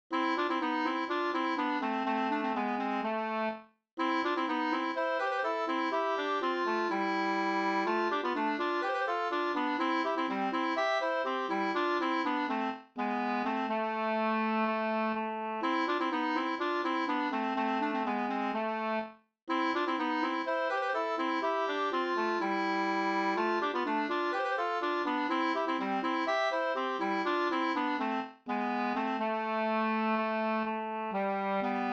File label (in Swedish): humppa, 2st